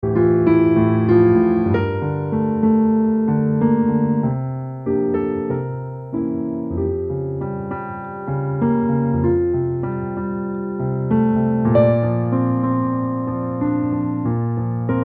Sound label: piano